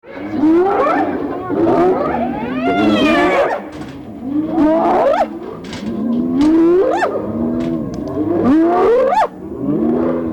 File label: hyena response